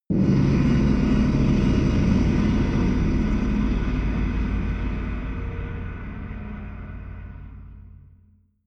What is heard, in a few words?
fire thrust distortion, hits impacts; whooshes rises